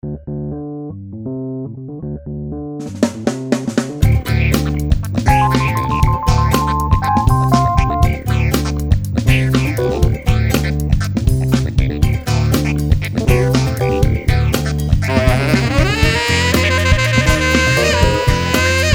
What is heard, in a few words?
Disco funky